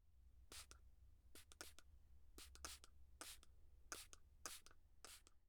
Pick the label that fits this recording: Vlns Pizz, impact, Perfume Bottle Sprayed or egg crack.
Perfume Bottle Sprayed